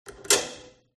knob clothes dryer; fox audio

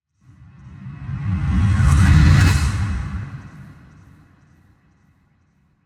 Just Transitions SciFi; soundbits

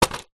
household medicine antibiotics box drop carpet

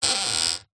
household antique larder door creak; zapsplat

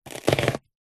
food chop onion with knife single slice

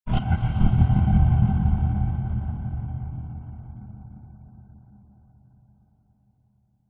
cinematic hit dark horror growl; sound design

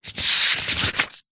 book large thick page turn; zapsplat

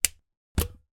mains plug switch off remove from wall socket

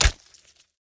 Just Gore Splatter Splat

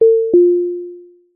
vehicles aircraft call bell dual tone; zapsplat

